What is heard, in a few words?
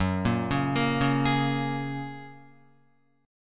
F sharp Major Add 9th F sharp, Guitar; arpeggiated; 1,1,1,3,1,4; 4F,4A,5C,5G,5C,6E